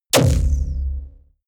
sci-fi plasma cannon fire deep space; schusswaffen

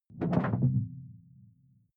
sci-fi alien bloom stop; swooshes-swishes

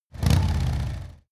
motorcycle harley engine low rev short

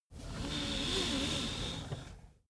car seatbelt release slow

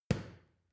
boxing paw hit soft; boxen

